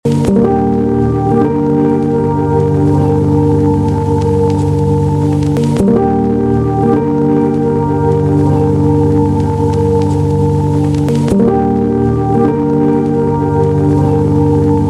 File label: Loop